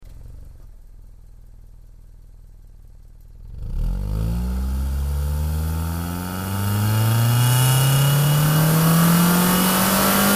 1986 4-cylinder Sports Car; Quiet Idle With A Few L; Sports Car Accel